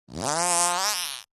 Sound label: human fart forced weak; zapsplat